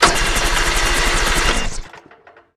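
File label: Weapon auto axe fire loop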